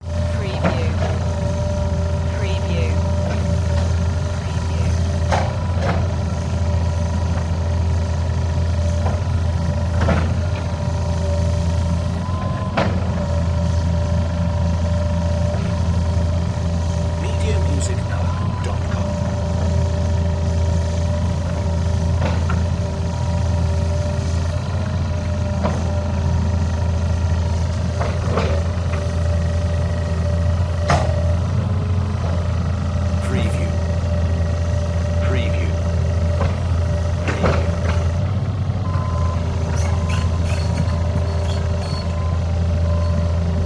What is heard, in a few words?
Digger